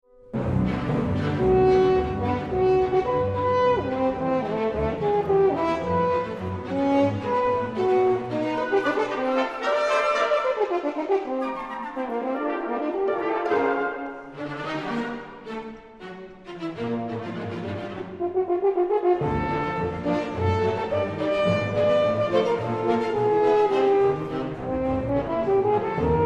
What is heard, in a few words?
concerto for horn